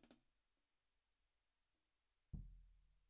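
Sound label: Snare Top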